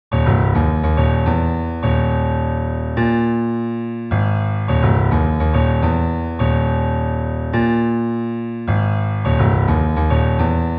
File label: Bass